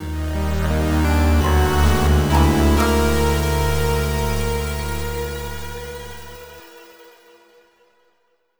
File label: Adventure Jingle; Spelunky